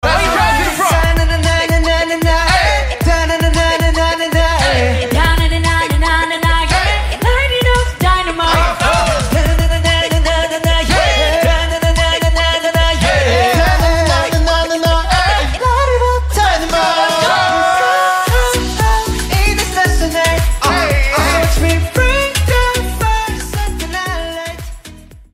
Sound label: Tropical